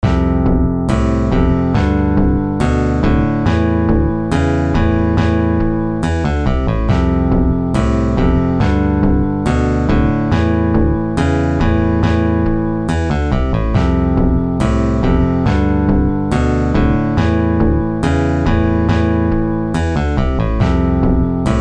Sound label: Guitar